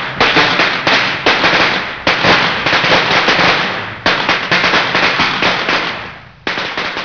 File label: firework